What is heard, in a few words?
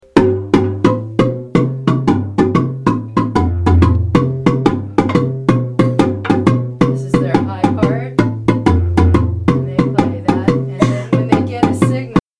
bass high, marimba